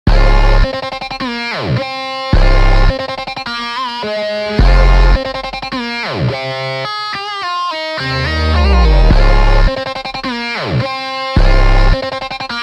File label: Life Force Slowed Reverb Remix